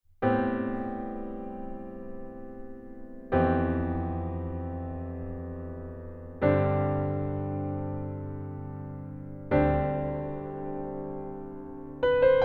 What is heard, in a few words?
FutureBass Sketch